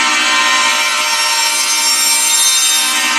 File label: A#3, TURBOBELLS; Northstar; The Wizard VOL-1